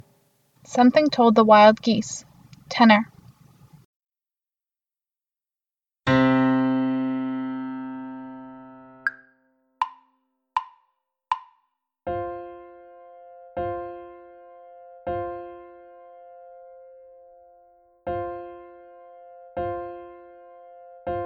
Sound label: something told the wild geese tenor